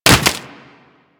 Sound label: Sword And Whip Finisher Puncture; Warframe